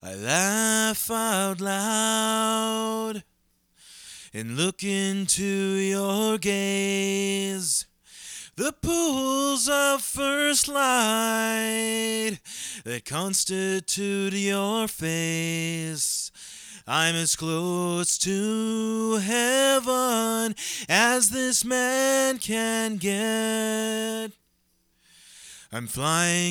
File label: vocals lead original